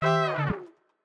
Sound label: Trumpet Pop, notifications; Samsung Galaxy S25 Ultra; Fun